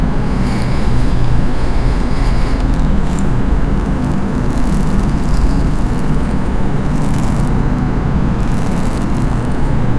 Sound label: fine sand drifting in air